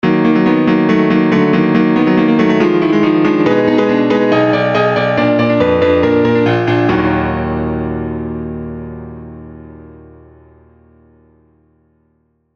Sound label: piano